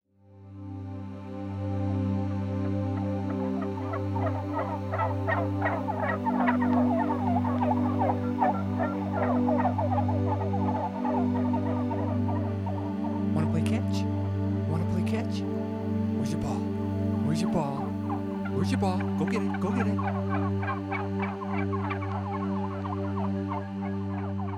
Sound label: musical walk